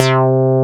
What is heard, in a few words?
RES BASS; AKAI S6000 CD-ROM - Volume 1; SAMPLE WAVE MIXING 1